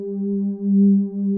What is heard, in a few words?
ANALOG PAD G2; Music Store - Claudius Diemeter Schwerlos